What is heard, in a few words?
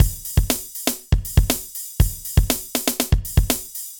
Synth Loop, BPM120